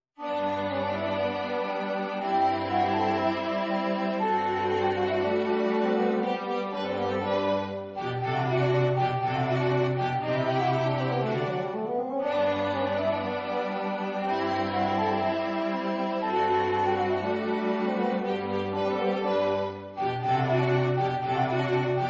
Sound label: Quartet